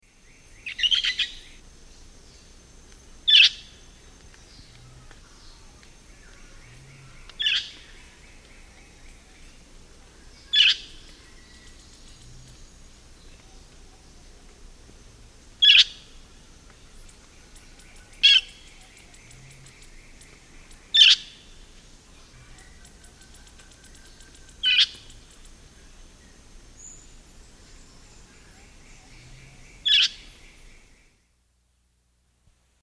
Brown-Hooded Parrot, Nature's Songs, S. America

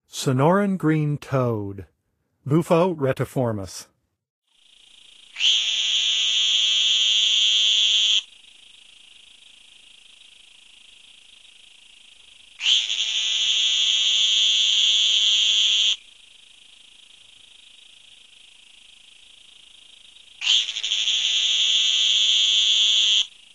Sonoran Green Toad; N. America; Songs of Frogs & Toads of North America

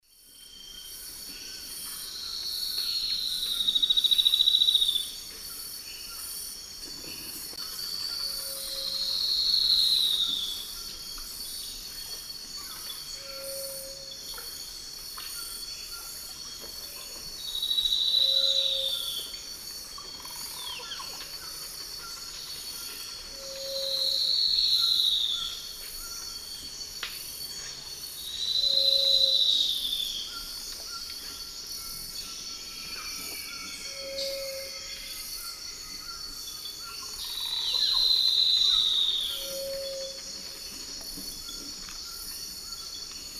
Schistocichla leucostigma, duet; S. America; Eastern Lowlands

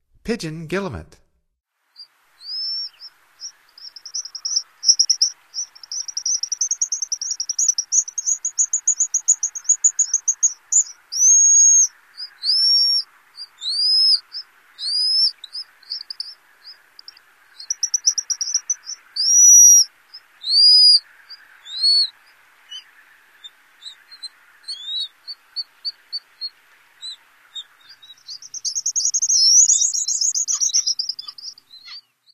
Pigeon Guillemot; North America; Stokes

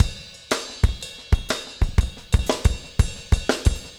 motown 120, loops 120bpm

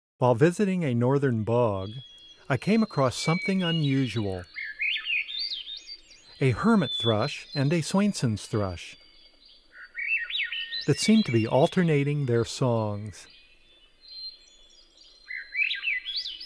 Hermit & Swainsons Thrushes, Bird Sounds; North America; Common Western Species; Know Your Bird Sounds

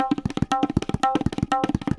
tabla 120

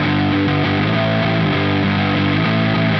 Riffing In Five, Rock, Guitars

